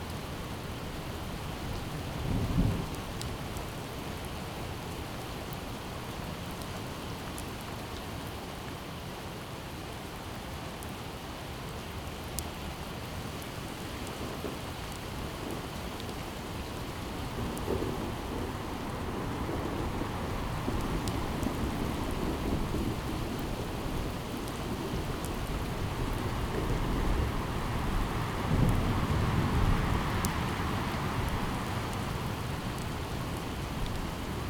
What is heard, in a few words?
ambient Outside Rain Wind Thunder; Zomboid 41.78.16; Project Zomboid